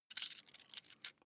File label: coins big search